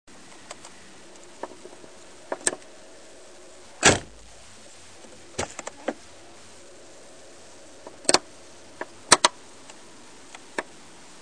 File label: door hinge noise; diy